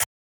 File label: Thunder Percussion Shot; Cymatics - Astro